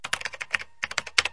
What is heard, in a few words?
typing on keyboard; Sound FX Real Life